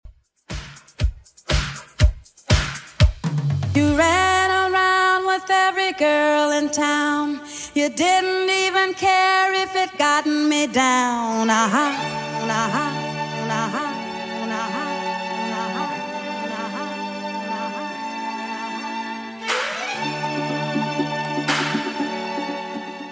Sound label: 70s Redrum, 120 bpm